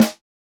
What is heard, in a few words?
snare samples, Rock